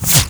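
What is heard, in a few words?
bullet left to right, nearmiss; arccw go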